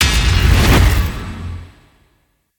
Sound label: rebirth emerge; smite; npc; grkconq phoenix